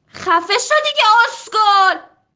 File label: music